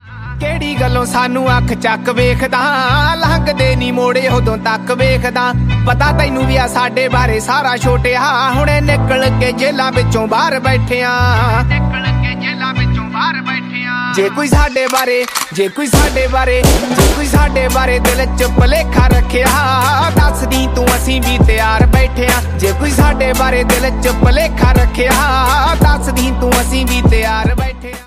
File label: Armed ringtone